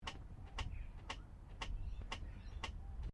CAR HOLDEN FJ INDICATOR LOOP, Classic Australian cars; Car Holden Fj 1955 Indicator Loop; Cars (Sound Effects:Vehicles)